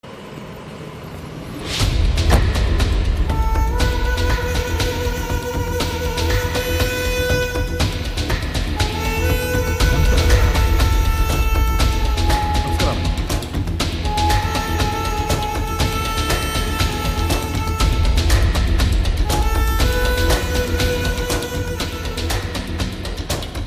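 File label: Villain Bgm Ringtone; Ugram; Kannada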